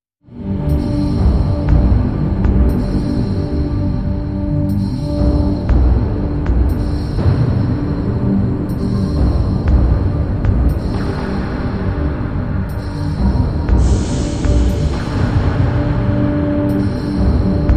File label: Beat